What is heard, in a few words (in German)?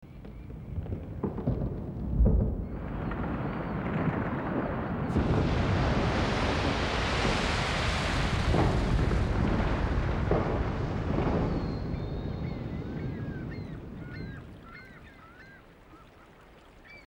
gletscherabbruch